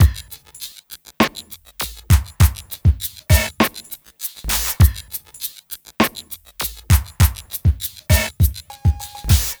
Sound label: Smooth, R&B Drums